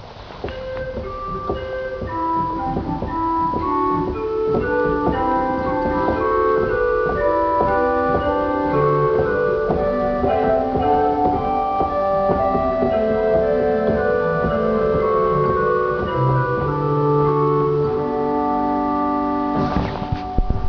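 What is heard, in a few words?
Rohrfloete 4, organ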